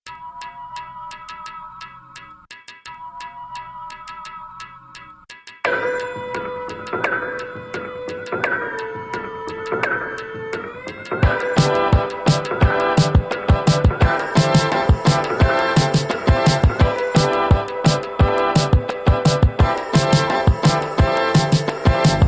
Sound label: crawl; jazz club